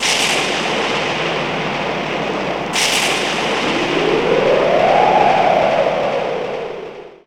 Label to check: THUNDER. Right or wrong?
Right